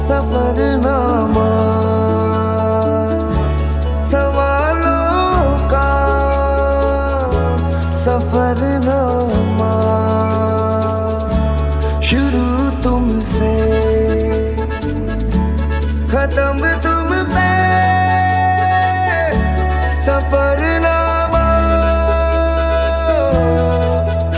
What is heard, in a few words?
Hindi Ringtone